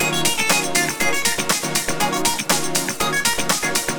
Electro, ringtones; Google; NewWave Labs

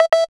Camcorder Stop; LG LV7500 Whistle; camera

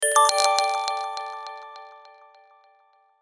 Chimes

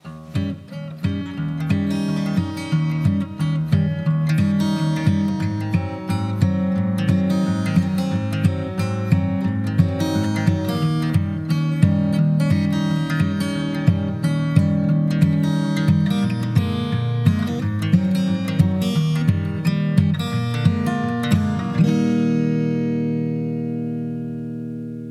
ringtone guitar; Mudita Pure

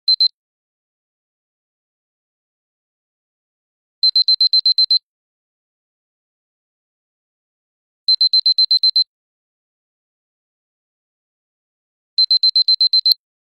Clock alert; Nokia; Asha 500

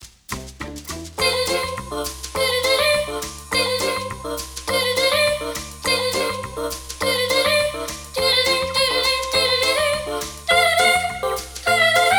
A Days Pleasure, ringtones; LG G3 A

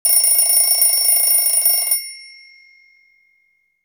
Traditional wind-up, Alarm Clock; Nokia; Nokia Design Team; Alarm Clock Retrospective

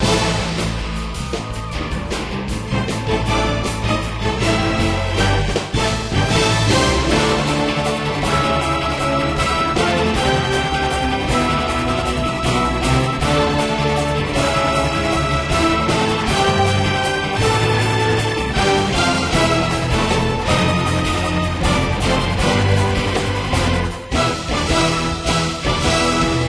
Action; Samsung Movie Maker 1.2.06

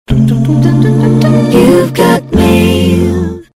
Acappella; LG GW820 eXpo

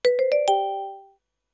Triumph, notifications; Umidigi; F1-Play

